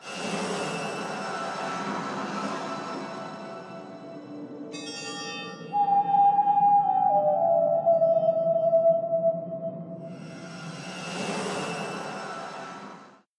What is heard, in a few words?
Jingle; Mule Kick; Mob; Call of Duty Zombies